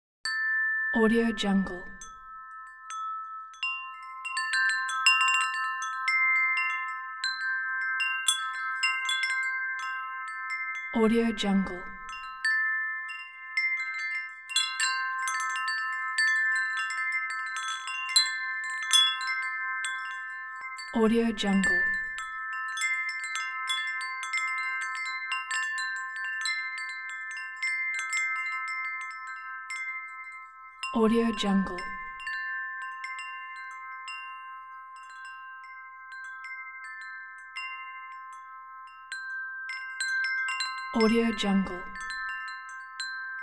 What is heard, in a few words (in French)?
Carillon a vent